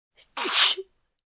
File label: Young Lady Sneeze